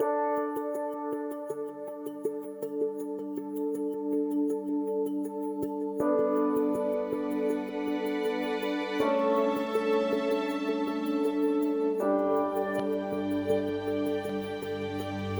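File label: Music Orchestral, Orchestral Post Race 80bpm; Forza Motorsport 6 Apex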